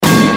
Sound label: metal pipe fart